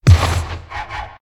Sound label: mushroom dam gup, monster; Senillinea